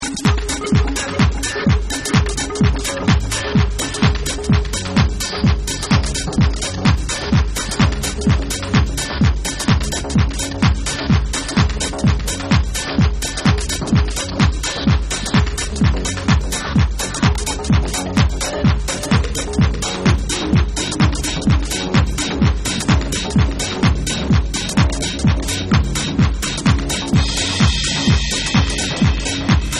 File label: SINE WAVE